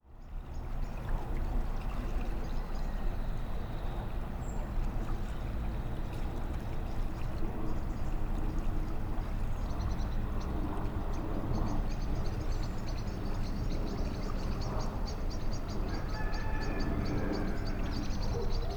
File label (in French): Ambiance; St Priest Taurion